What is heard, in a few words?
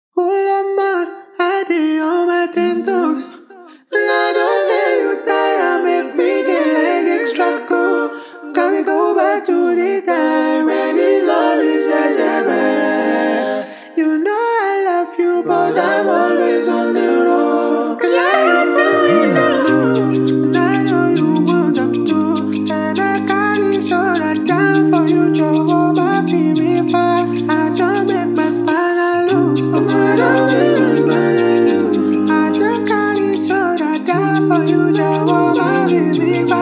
Xtra Cool Acapella